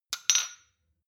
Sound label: shot glass clink double; soundpool